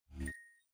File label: button mouseover